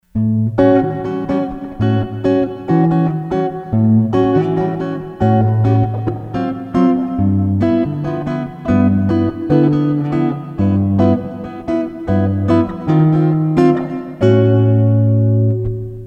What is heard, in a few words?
Samba Note, Sony HR-GP5